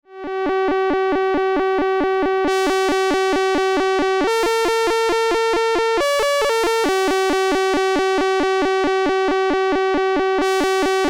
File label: Three note tunable sequence; TTSH Patchbook